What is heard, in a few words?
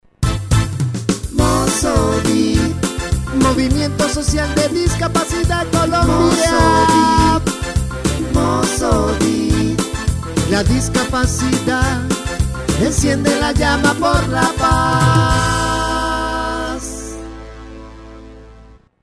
JINGLE